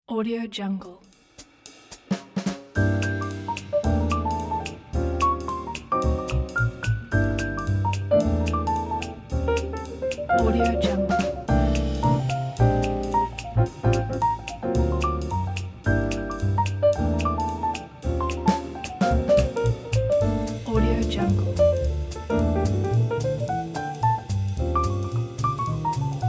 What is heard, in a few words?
jazz piano